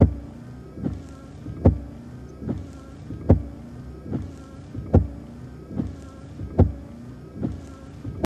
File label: Saab Wiper Blades, windshield